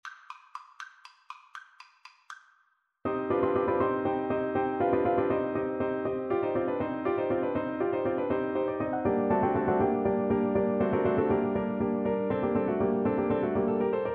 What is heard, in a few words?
Clarinet